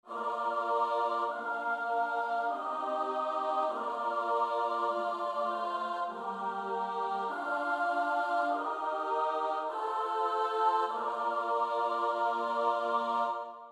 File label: midi; vodnansky; rorando coeli; glosy rowne